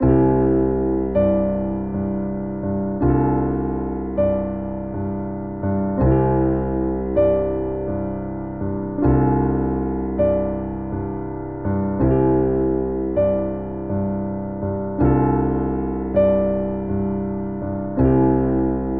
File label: Ostinato Relaxing Cmaj7 D C Bb C 80 bpm, Grand Piano; MIDI Clips; Ableton